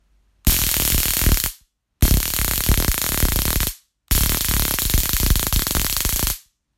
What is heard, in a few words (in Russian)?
zvuk shoker police